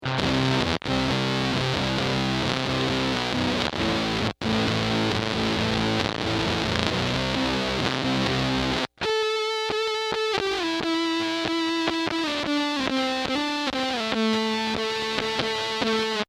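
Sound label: Octavia, guitar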